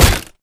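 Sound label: impact bullet light ballistic android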